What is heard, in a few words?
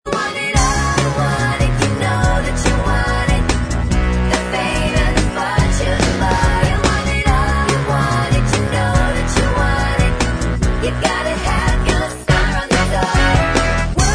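backing vocals; high school musical 3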